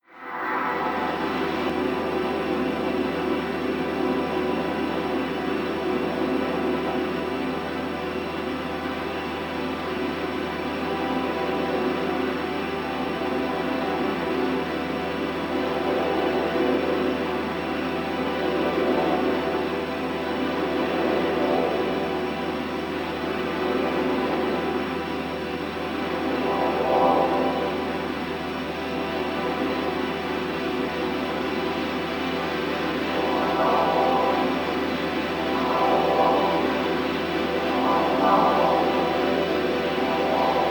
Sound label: Pad Sweeps; Poldertechno 154 style sweeping pads; Typical 90s style dutch ambient techno sounds (also known as ‘Poldertechno’); STAR SHEPHERD SYNTHESIZER